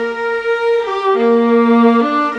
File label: Viola, Cinematic; Sonic Foundry (Sony Creative Software); Orchestral Series VOL-3 - Cinematic